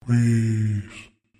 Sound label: click; monstergo; pokebot